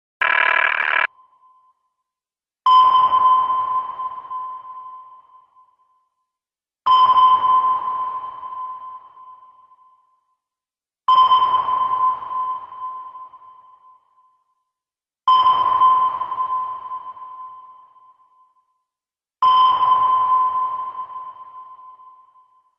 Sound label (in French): radar bruitage